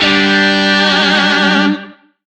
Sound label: Shawzin Chromatic Chord E; Music; Warframe; Lizzie Shawzin